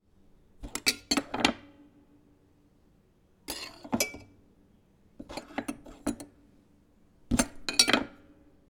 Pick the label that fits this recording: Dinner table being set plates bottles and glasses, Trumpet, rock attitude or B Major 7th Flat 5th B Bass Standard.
Dinner table being set plates bottles and glasses